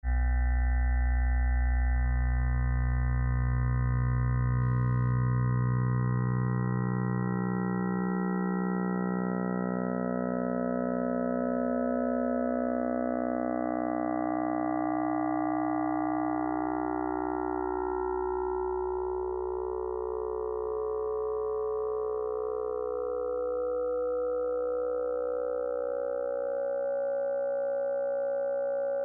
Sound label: Transwave